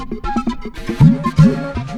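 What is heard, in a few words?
Music Dpad Base 120; from dust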